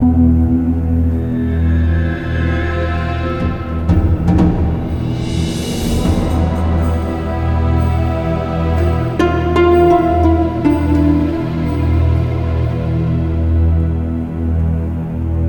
Caves Melody Loop; Ninjago Monastery; lego universe macos windows gamerip 2010

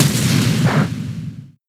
Techno Blast; pokemon sfx gen 6 attack moves xy oras; Techno Blast part 2

